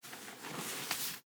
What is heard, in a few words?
clothing rustle